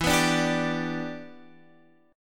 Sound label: G Major E; G/E Chord {X 7 9 7 8 10} strummed